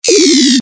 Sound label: radar offline; CAC ASSETS